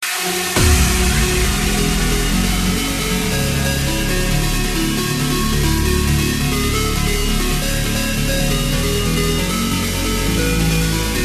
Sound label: Trance